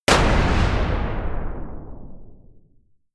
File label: Medium Collision